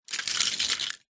leisure toy metal cars pile push around carpet; zapsplat

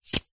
aerosol deodorant can catch

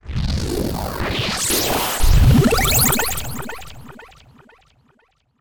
sound design lo fi glitchy transition

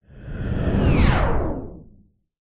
sound design whoosh into slow motion; zapsplat

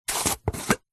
peanut butter plastic jar lid remove; food; zapsplat

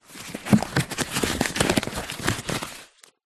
rubber glove handling

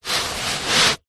foam board slide on surface; zapsplat

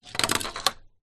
leisure toy car metal down on surface; hot wheels

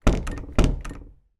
household door front already shut push check x2; zapsplat

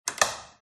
switch off electric cooker; fox audio

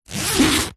foley clothes dress gown zipper back zip; zapsplat

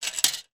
game connect 4 playing disc place in frame

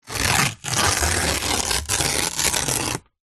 Paper Rip Slow

